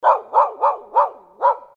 dog barking

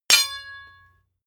hammer hit metal ring

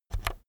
keyboard external key press fast soft

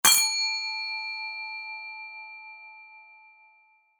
japan rin bowl crash, F

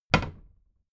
cabinet door close heavy

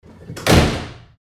door close

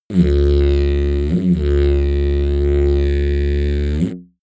didgeridoo heavy soft

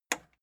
plastic button small simple push

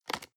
plastic box food small open hard

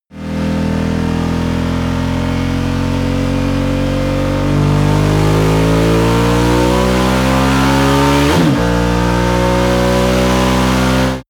motorcycle ducati cruiser acceleration fast

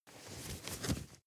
nylon leather very soft

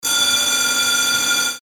alarm bell

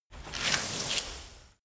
chain mail pick up

